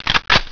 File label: GUN COCK